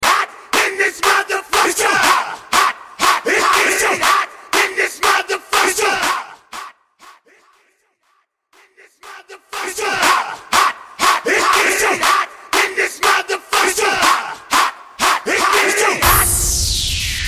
Trap Mainstream Mixshow Clap A Pella Starter

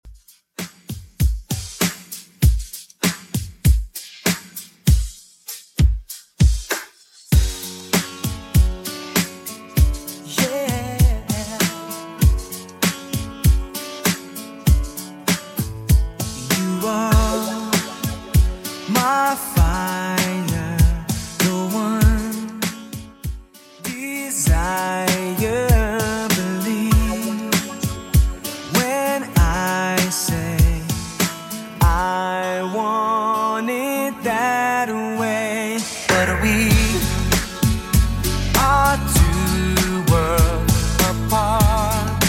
90s 98bpm; Redrum

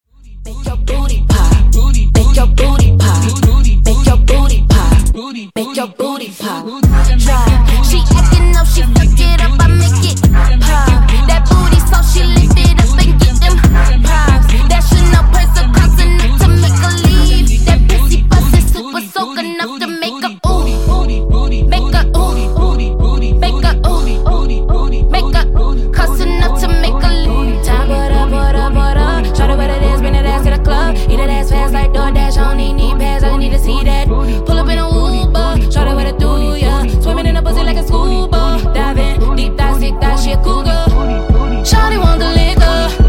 Funk Intro Break Outro 71 Bpm

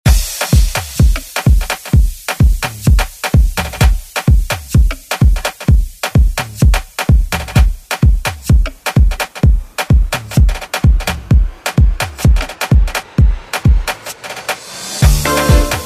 Latin House Redrum

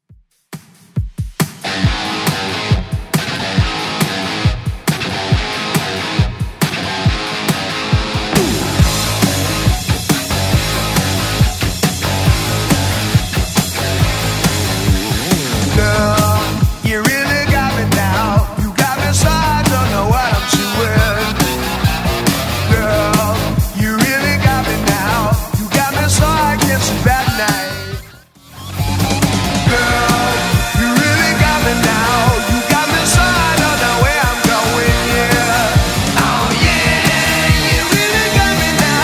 70s Rock Redrum, 138bpm

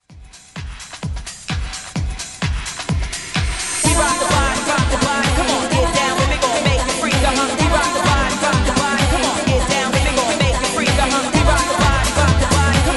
90s Dance Hype Re Drum 128Bpm; DJDOLLS

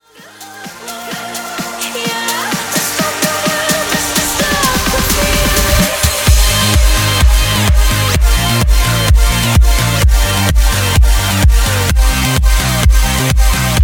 128bpm Cm

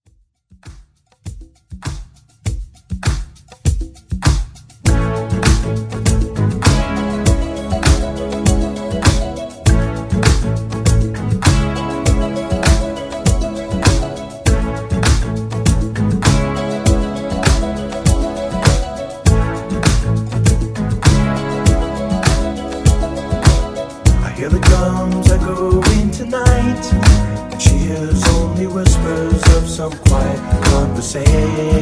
80s Pop

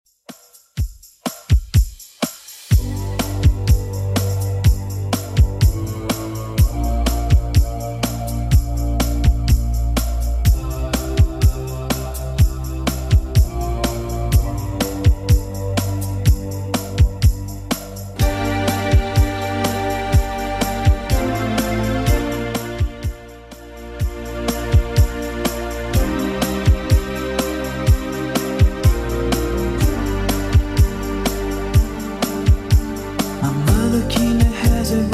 90s Redrum 124bpm

